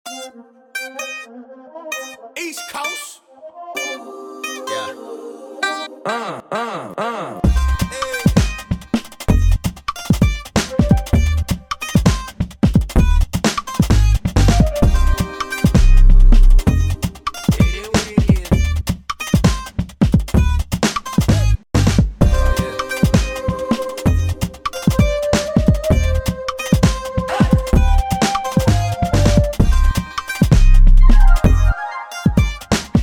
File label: Hip Hop